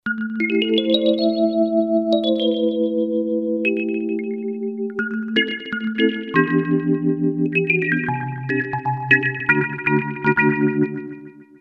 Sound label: lounge efx sound